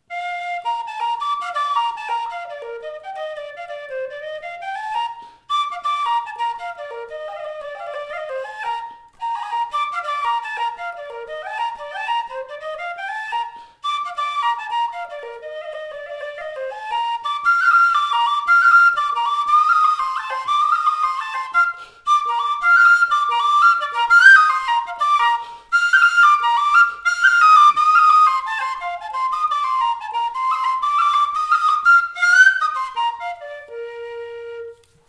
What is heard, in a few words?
Freeman Tweaked Bb Generation Jig, whistles